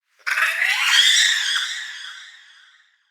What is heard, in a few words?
Settlement Creature High Mid; Warframe; Grineer Settlement